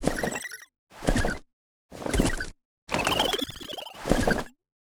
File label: THORNASH WAR IDLE